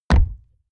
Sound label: horse step wood